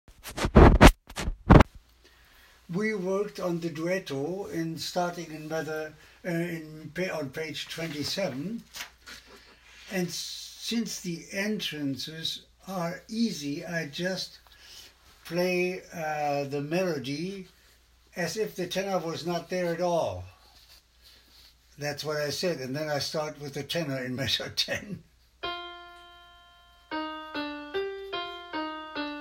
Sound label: BWV 131, Alto